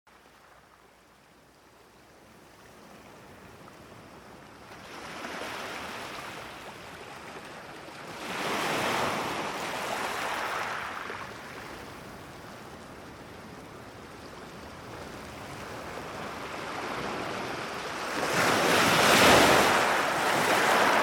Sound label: Sound Waves